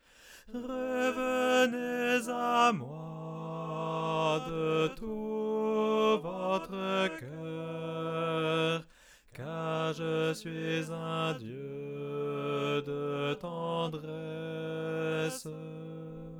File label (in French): ensemble S; Soprane